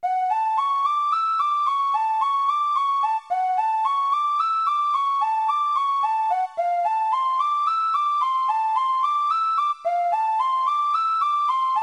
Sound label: Elf musician song